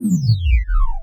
Science Fiction Sci-Fi Electronic Computer Shutdown